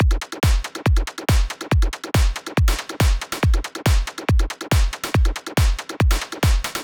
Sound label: Loop 140bpm Full